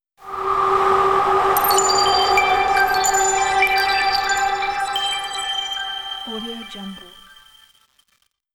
ice reveal logo

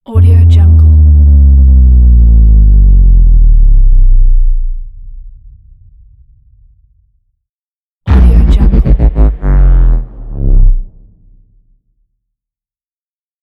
cinematic bass drop

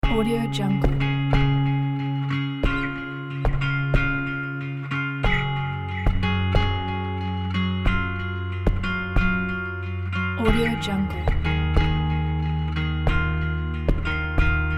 indie folk

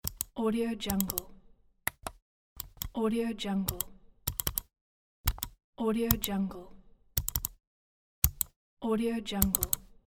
mouse click pack

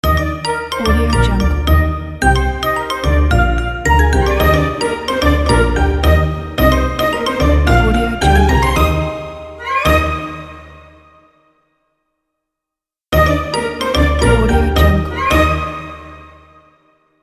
cartoon animation kids logo

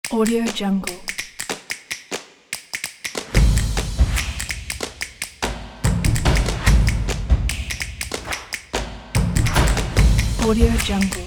drumming action

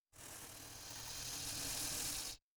creature vocal spiders hiss vocalize chew

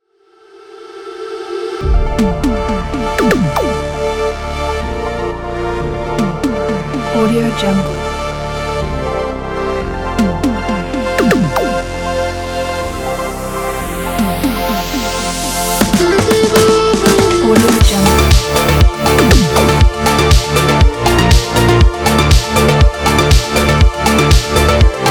the synthwave commercial

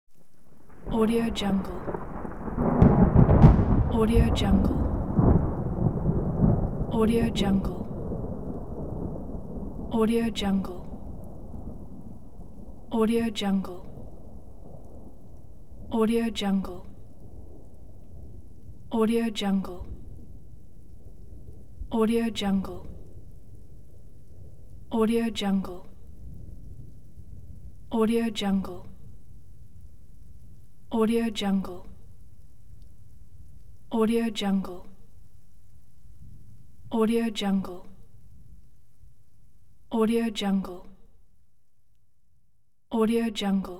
thunder low punchy light rain on pine; hollywoodedge

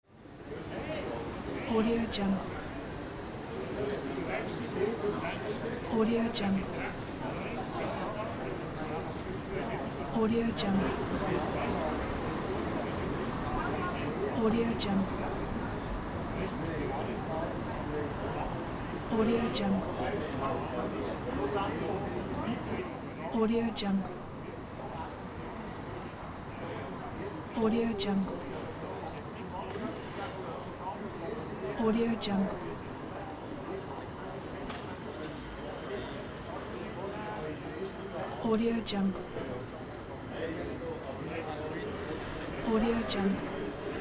city bar ambience

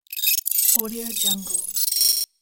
scifi text

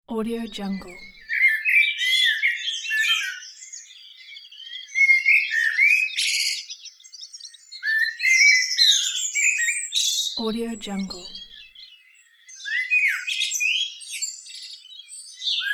ambience morning glory birds whistling pack

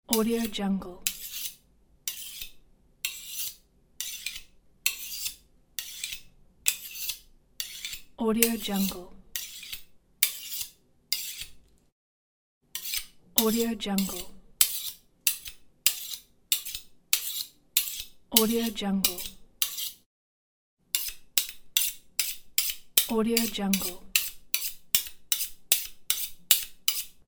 two blades scraping

